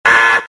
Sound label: buzz